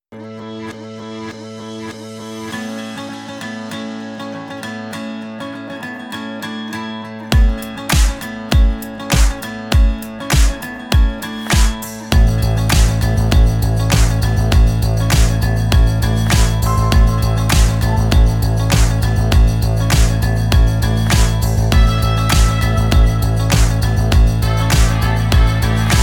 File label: music